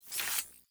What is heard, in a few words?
digital whoosh